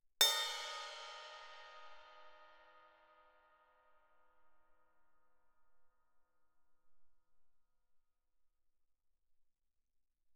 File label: crash stick bell ff, suspended cymbals; Percussion